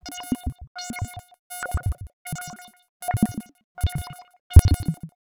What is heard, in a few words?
buzzer